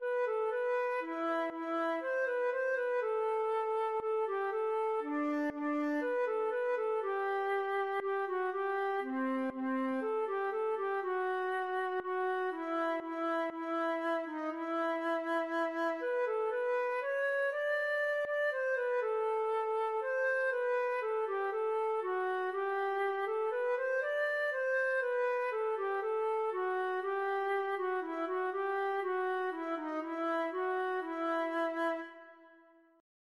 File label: buba, feadóg